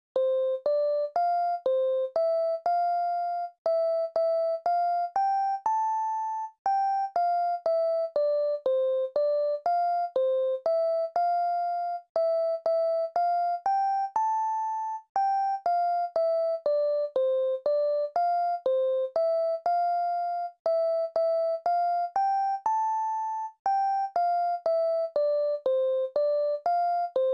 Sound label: Music